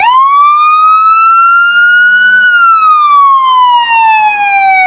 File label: wail, sirens; whelen cencom